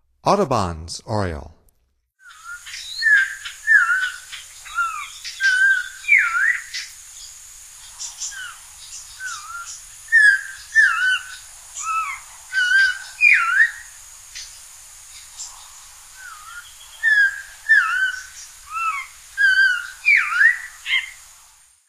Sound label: Audubons Oriole, Songs of Birds of North America; Stokes